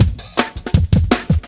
Jam 162, breaks

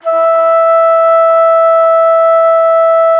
T. BONSURI, India Winds

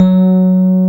Rhodes; Best Service Gigapack 1

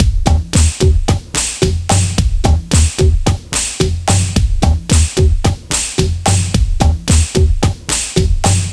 Techno 110; Foundry (Sony Creative Software); Hip-Hop Street Beats Construction Kit; FunkyXtreamsII

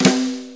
Brush Snare; Sonic Foundry; Loops & Samples Drum Tools; Brushes

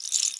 RAIN, PERC; Xsample VOL-9

